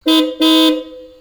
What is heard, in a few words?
hella horn